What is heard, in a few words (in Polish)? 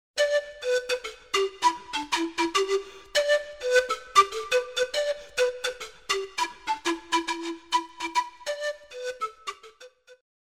fletnia pana; druzyna marzen; 5-latek